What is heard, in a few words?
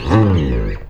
FRET ROLL, Electric Basses; E-MU Producer Series Vol. 8 – Platinum Phatt